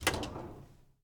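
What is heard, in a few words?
DOOR Oven Open; mono; Universal Sound FX; DOORS & GATES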